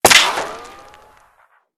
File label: ricochet; Escape from Tarkov